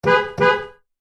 vehicles suv horn short honk internal garage nissan patrol